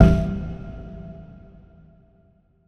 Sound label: Stab E; Ableton Live 12 Lite; Core Library; Visions